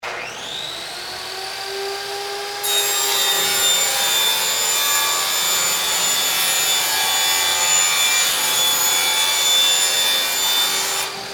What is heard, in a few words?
Panel Saw Plywood, Rip, Sheet; Saws (Sound Effects:Machines); BlastWave